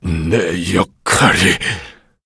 hit

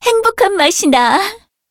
levelup; Cookie Run

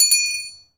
Golden Bell Jelly; Cookie Run

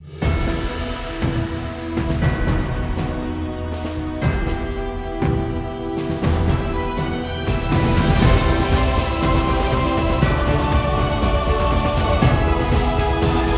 Gacha theme; fire spirit

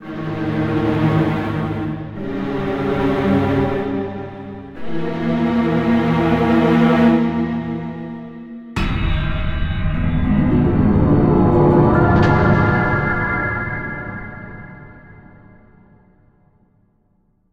Gacha theme; butter roll; cookie run

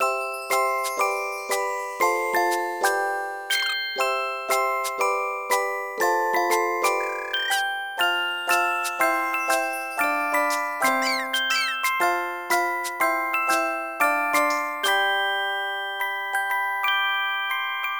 Bgm ui shop

